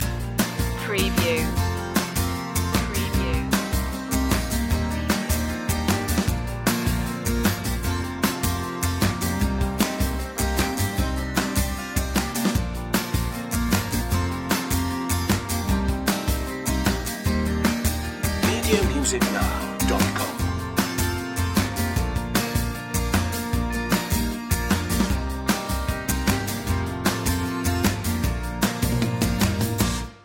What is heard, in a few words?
springing indie loop